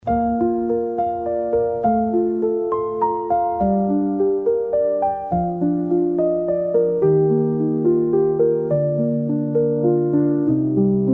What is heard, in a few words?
bg music